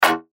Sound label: Beep Tone Signal Multimedia